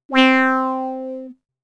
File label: Wah, synthlead